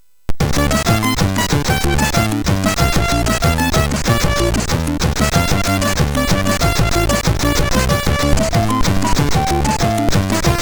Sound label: sid MOS6581